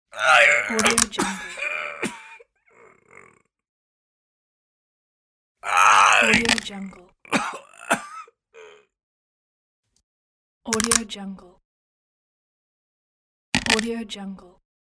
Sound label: bones breaking with male agony groans